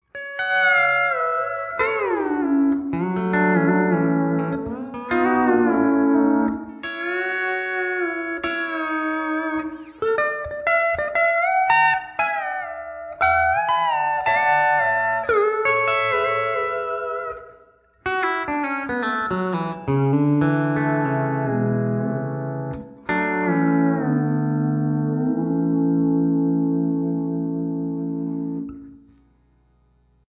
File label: Spring; ToneX Pedal; Spring Reverb